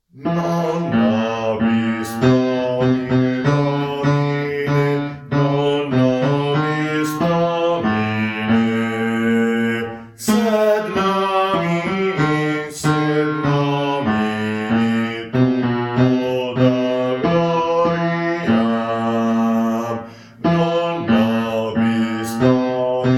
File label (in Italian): bassi; Non nobis